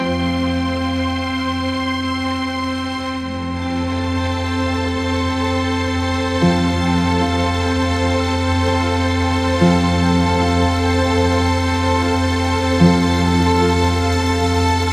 epic elegance cinematic overture, ringtones

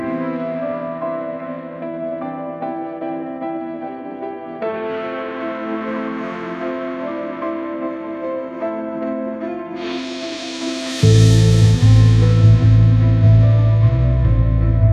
elegant strings and chill piano; ai

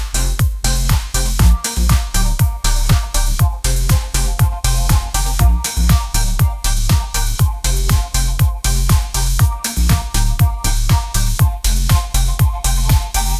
upbeat pop melody, ringtones

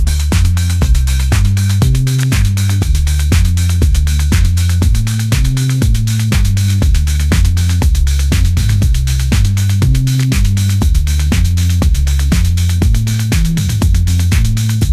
pop groove

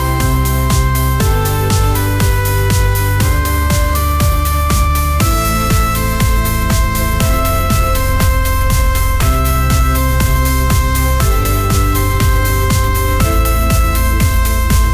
peaceful life pop groove

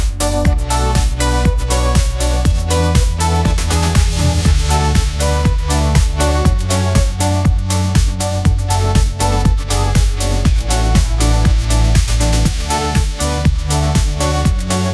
galactic parade pop anthem, ringtones

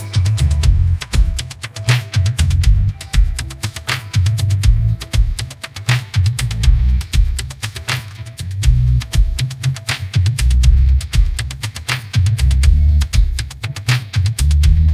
devotional pop anthem for mahadev, ringtones

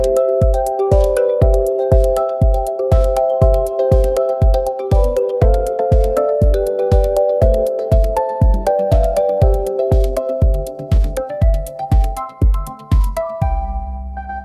crystal clear pop ringtone

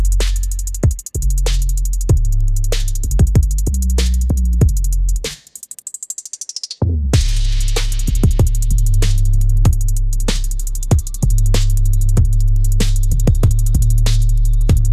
shadow alley hip hop beat, ringtones

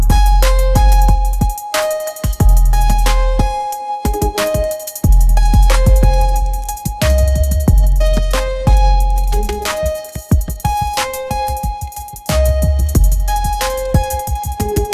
dark champion entrance; ai

